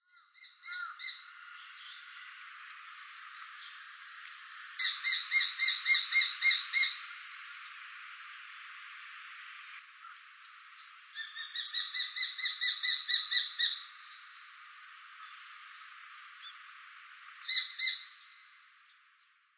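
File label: Japanese sparrowhawk, utsunomiya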